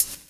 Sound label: Hhat, Hihat; Equipped Music; Loungin House